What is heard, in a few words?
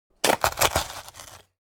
Digging shovel into dirt single